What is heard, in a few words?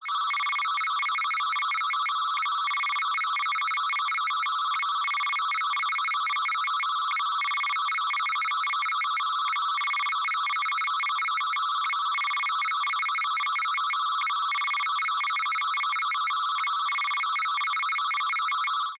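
science fiction telemetry data receive transmit fast; zapsplat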